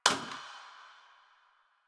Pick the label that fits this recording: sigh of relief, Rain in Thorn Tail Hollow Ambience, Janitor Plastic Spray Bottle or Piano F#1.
Janitor Plastic Spray Bottle